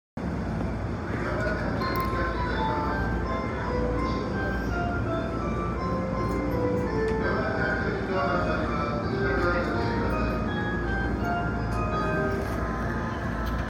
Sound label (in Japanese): JR 東海道線 横浜 南, train sounds